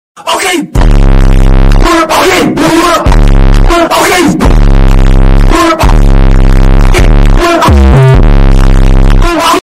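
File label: sound of bass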